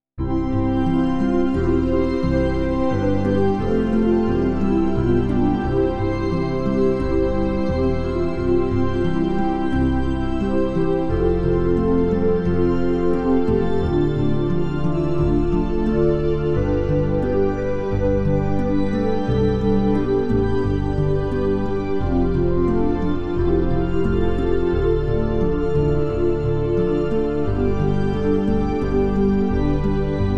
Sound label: Mealtime Prayer (Grace) (backing); New Hope Music